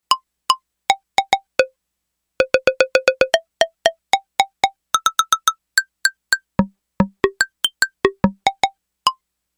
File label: Wood Block, Yamaha DX11; Factory Preset Voices